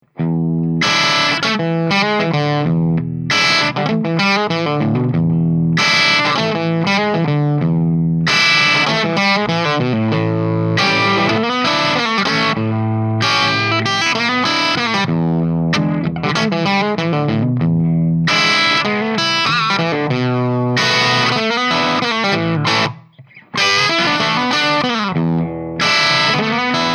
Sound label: Les Paul P90